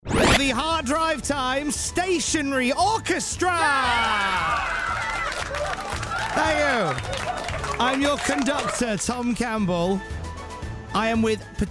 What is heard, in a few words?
stationary orchestra